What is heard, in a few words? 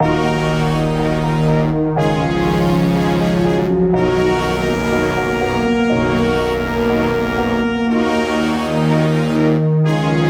Melody Loop Dmin 122 BPM; Trapify; Cesiumia